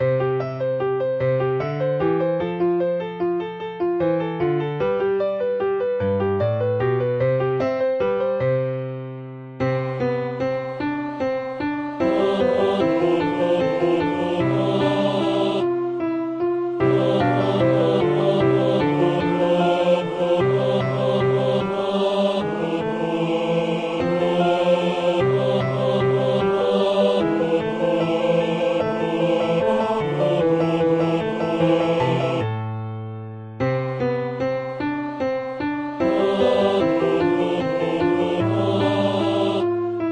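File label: tenor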